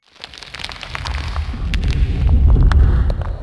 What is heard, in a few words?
Monolith falling; asgard